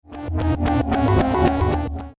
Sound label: Unhinged Brief Horror Movement; Scary Soundscape Sound Effects; music for audio logo, web background, videogame music; Andrew Fleming